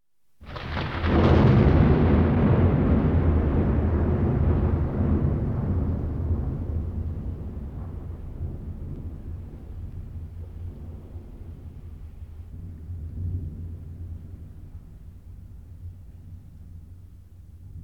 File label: Ukkonen Salama Thunder A Few Lightning Strikes Rumble Boom Thunderstorm